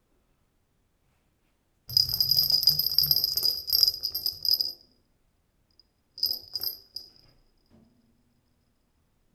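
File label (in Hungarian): jobbról gurul nem megy végig kicsit visszagurul, csörgőlabda játék asztalon gurítás; Perkupa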